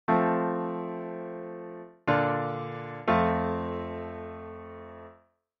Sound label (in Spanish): Plagal Iv A I 6 F, cadencia; auditivo de cadencias autenticas plagales rotas y semicadencias; Identifica la cadencia